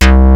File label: SPLIT; Rubber Chicken Software Sample Bank